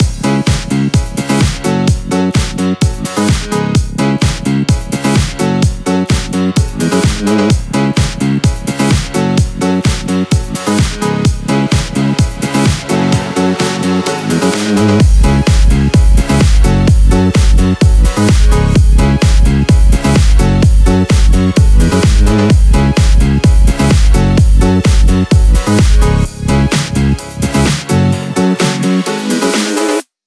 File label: 128BPM Mix; Vengeance Samples; Tron Quarter Kit